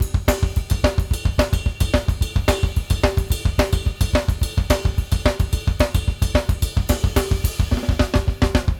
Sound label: Drumworks; Sampleheads; New York City Drumworks VOL-1